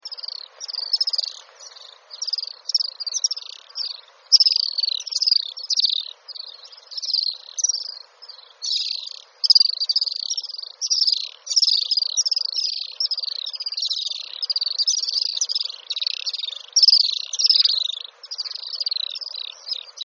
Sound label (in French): martinet des maisons, oiseaux